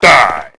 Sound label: die; prop hunt x originals; cdi